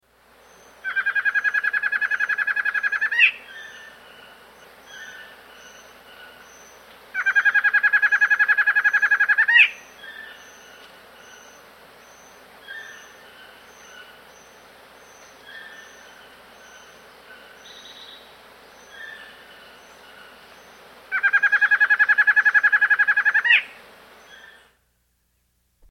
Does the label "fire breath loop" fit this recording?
No